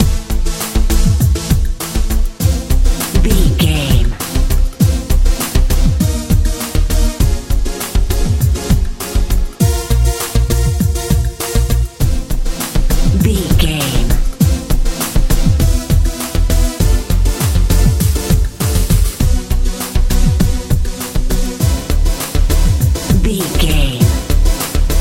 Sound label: bright warm swirl big life modern dance pop